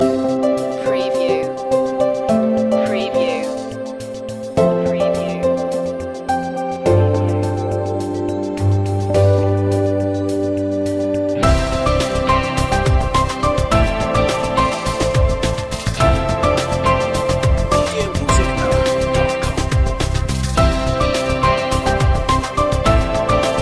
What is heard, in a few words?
corporate heart